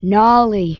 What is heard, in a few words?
vocals, gnarly